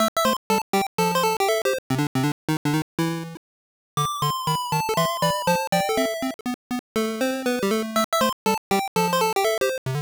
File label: Percussion Pinball; Tails and the Music Maker; Pico